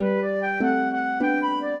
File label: trio, flute harp; Mozart